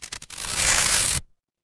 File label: paper fabric tear